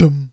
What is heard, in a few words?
"dum"